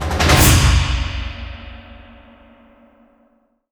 whoosh; stranglehold

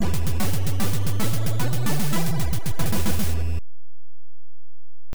Continue Screen; James Bond Jr.; NES

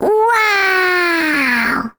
Classic Click Sound; Jolly Battle

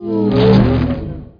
Monster; Conquer Online 2.0

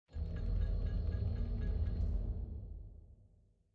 City Large Warehouse Moving Platforms; City SFX

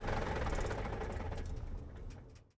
vehicle semitruck turnoff; teardown; ps5 windows xbox series xs

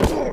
Slap; Rugby; PS2

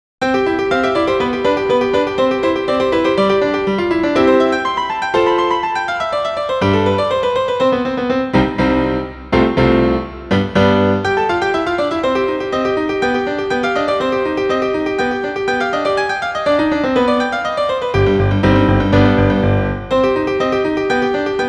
Piano Recital; Aitakute... Your Smiles in My Heart Original Game Soundtrack Vol.2; Michiru

